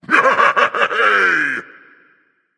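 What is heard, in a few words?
non verbal yay, voice; cernunnos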